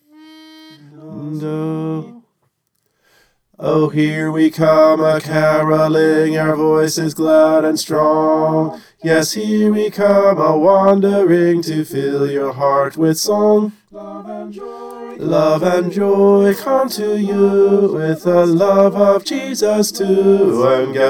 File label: bass